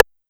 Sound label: 808 Rim, Percs